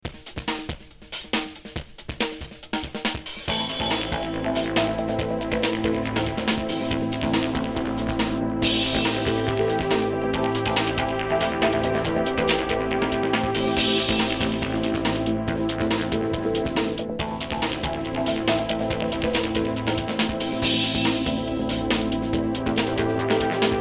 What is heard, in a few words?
Trance music loop